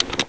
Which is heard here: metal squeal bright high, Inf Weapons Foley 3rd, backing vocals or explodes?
Inf Weapons Foley 3rd